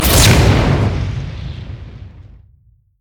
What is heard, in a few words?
summonerspell base ignite; LoL